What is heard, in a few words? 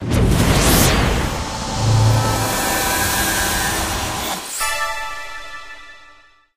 evolution end